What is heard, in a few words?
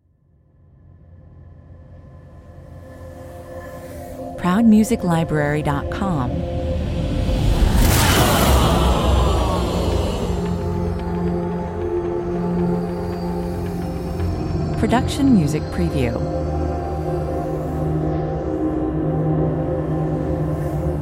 vapor noise (ambient rev suction)